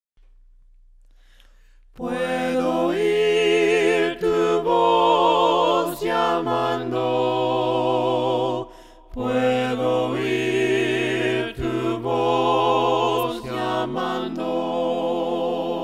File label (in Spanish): VOCES, himnos a capella; cantos del camino; PUEDO OIR TU VOZ